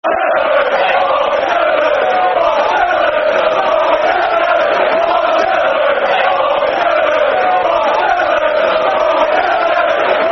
Once A Blue, Always A Blue, Football Chants (Soccer Songs); Man City Mcfc